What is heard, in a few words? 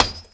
star impact; Tony Hawk's Project 8; XBOX